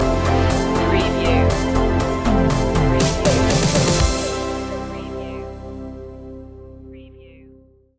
synth wave stinger

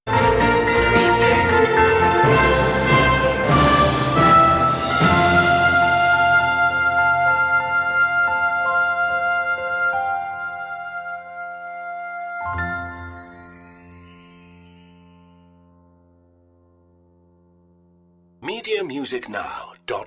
orchestral stinger; jingle bells; media music now

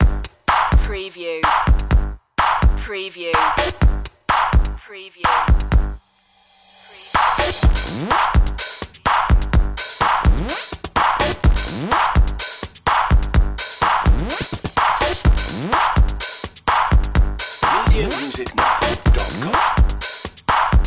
glitchrocker

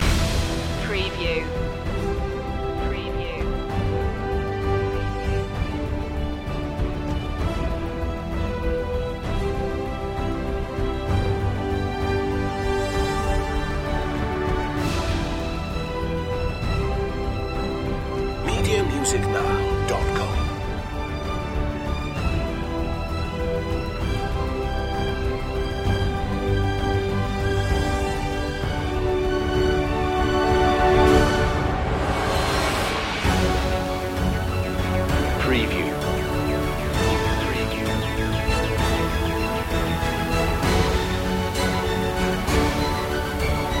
no choir

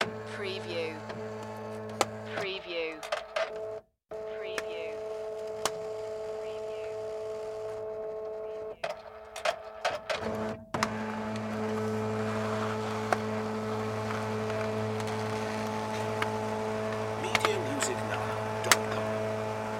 Fax Machine Operate

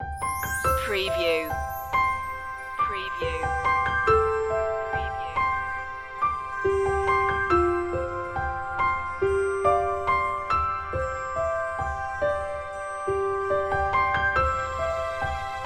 fragile orchestral

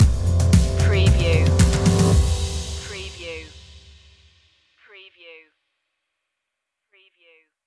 spreading your wings stinger

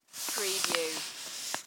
Envelope Seal Close; Sound Effects Bible

